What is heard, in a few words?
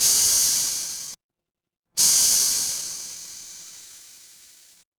mechanical machine